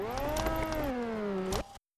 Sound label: crusher display close